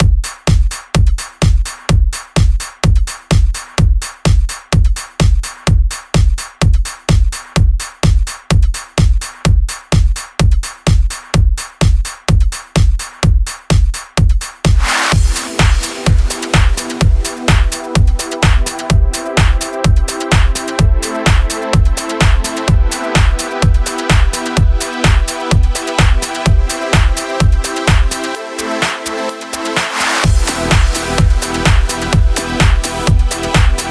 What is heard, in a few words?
bpm 127